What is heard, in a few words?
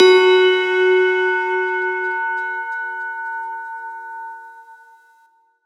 Riddim Melodic Hit - F#; One-Shots; Bellivision; Ghosthack; Riddim Essentials Volume 2